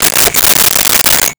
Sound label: Rotary Telephone Dial